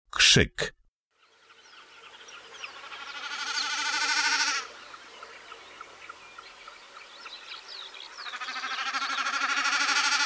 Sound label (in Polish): BEKAS KSZYK